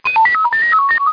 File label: menu command; FDesert